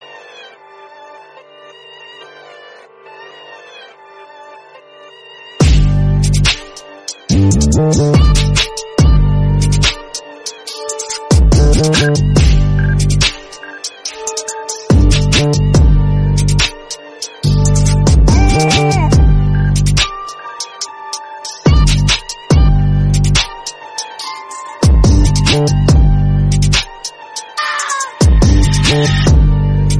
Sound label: instrumental